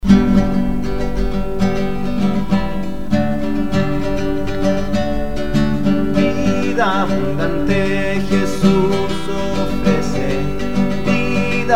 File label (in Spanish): Vida abundante melodia palabras